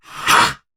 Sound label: Attack Effort; en; Warframe; Battle; Dax; DaxKnight